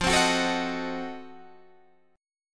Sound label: F 7th Flat 9th, Guitar Open D